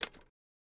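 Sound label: Typewriter Single Key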